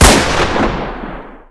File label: scout fire, weapons; 7615p; gmod